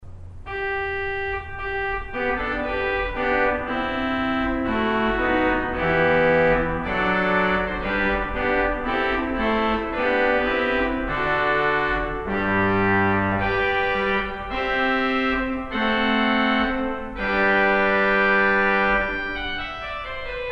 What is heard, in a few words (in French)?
trompette